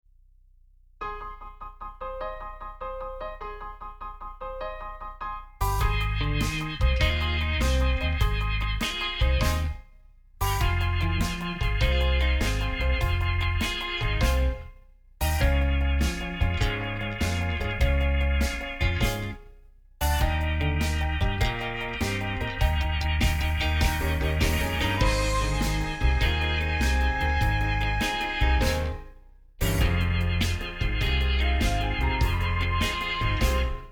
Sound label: accomp